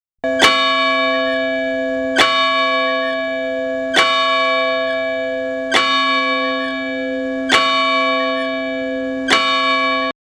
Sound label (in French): cloche; St Donat